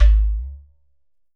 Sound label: GHATAM